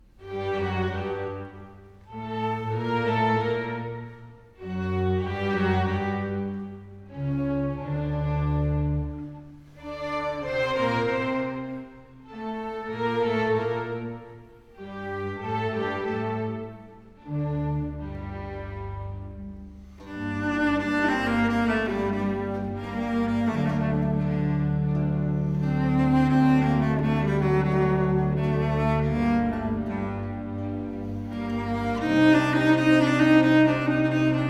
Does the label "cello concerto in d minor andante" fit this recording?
Yes